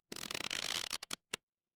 cartoon stretch

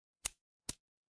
paper fabric cloth whip

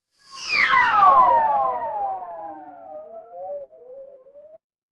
magic scifi teleport

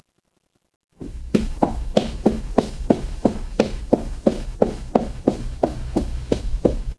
footsteps concrete

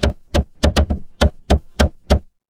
mechanical machine

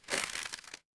paper fabric crumple

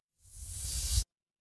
transition sweep

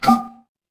magic scifi hologram

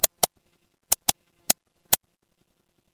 office mouse click

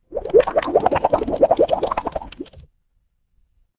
water bubble

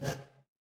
feedback error

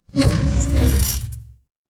magic scifi portal

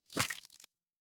cartoon splat

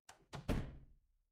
door close

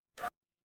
feedback confirm

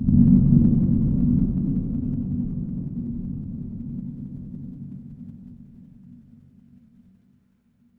horror ambience dark

